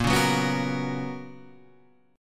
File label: B Major 7th Suspended 2nd, chord